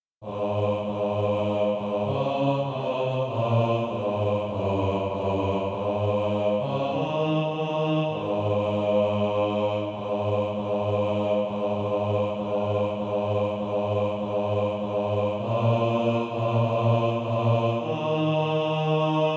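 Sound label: bass, SATB Practise Music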